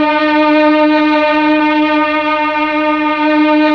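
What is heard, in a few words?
Mellotron Strings D#4, Slow Strings; Keyboards of The 60's and 70's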